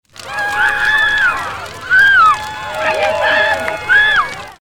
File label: screams; trogdor soundboard sfx